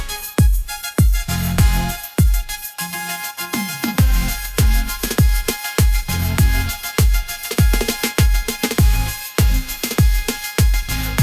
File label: k pop style, ringtones